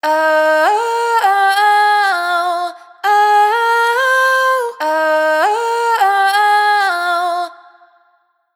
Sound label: riff Oh Woah 100 Fm wet, vocal; Slate Digital Omnivox Vocal Sample Pack